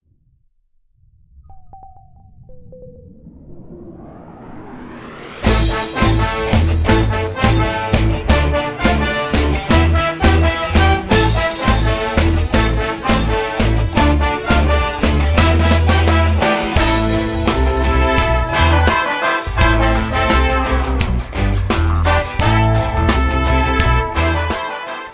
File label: Reggae